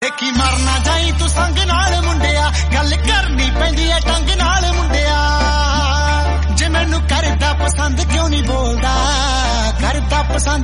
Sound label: punjabi love ringtone